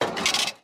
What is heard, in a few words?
Score Reveal